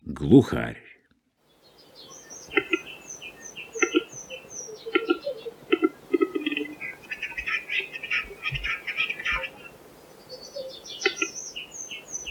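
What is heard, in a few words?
Capercaillie; Gluhar; Golosa ptic i zverei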